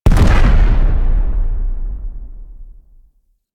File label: Enemy Ship Destroyed Explosion; Rail Jack